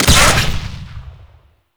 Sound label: Projectile Explosion; Enemies; Warframe; Vomvalyst; Teralyst; DE